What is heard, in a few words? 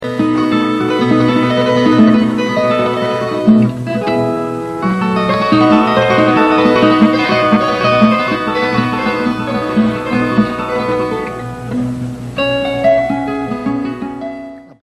viola caipira; instrumentos